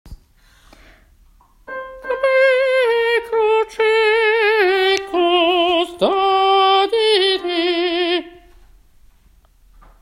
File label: Stabat Alto; Stabat 11 Alto